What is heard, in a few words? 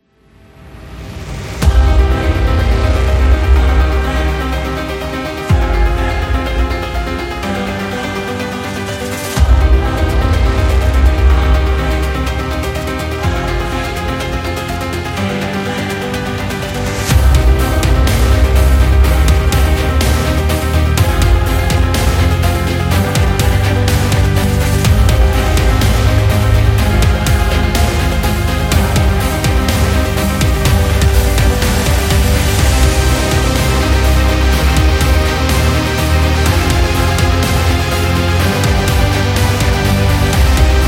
Epic Modern, epic sport metal